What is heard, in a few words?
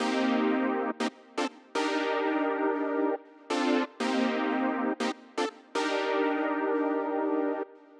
Synth 120bpm Cm, Nu Disco; Zero-G; Construction Kits; Legacy